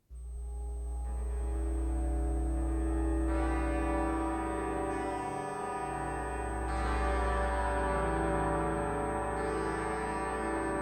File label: Blast